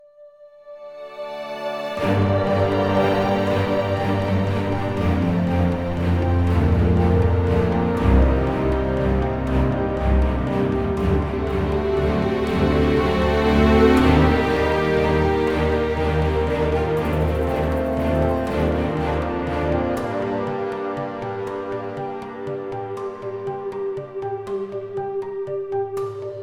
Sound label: Sandstorm; windscape switch windows xbox one gamerip 2016